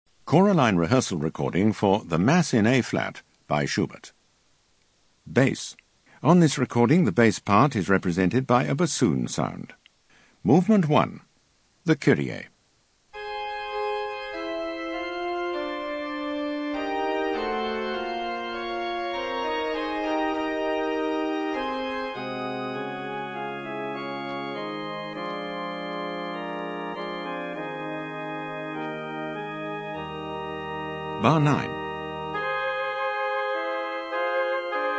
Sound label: Bass; Schubert Mass in A